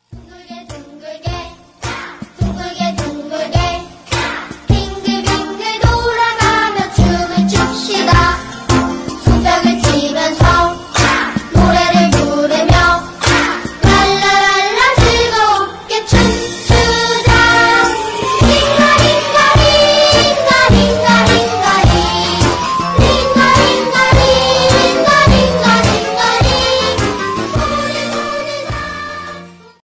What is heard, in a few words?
ringtone; Squid Game 2